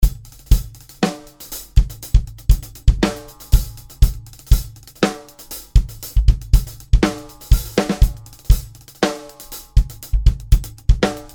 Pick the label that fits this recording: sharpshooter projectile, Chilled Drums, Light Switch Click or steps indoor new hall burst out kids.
Chilled Drums